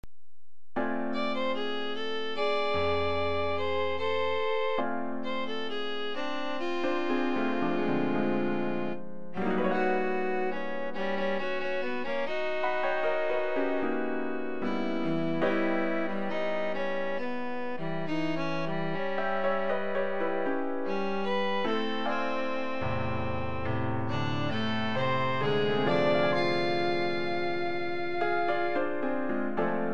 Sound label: piano trio beginning